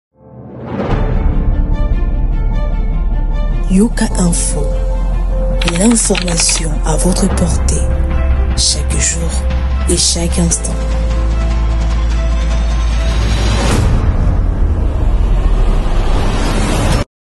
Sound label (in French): JINGLE; YOKA INFOS